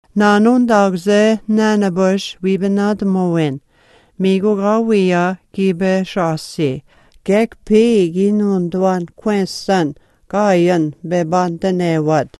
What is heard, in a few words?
geese